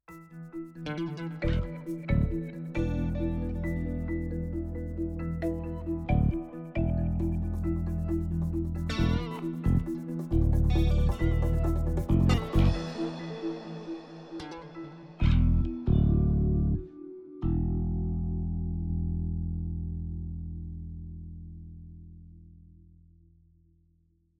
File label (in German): Comedyakzent Einbruch